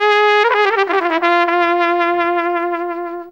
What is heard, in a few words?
ORIENT TRUMP; Best Service; World Colours